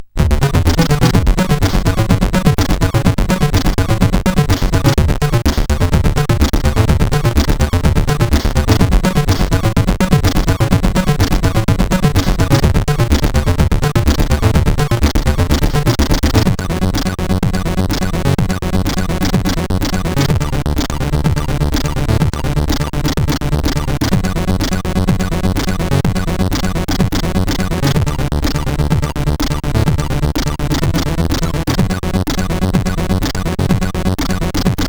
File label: PAL, sid CSG8580R5